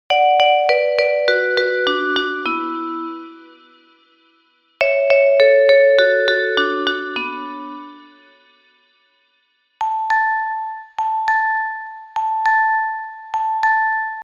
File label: Vibra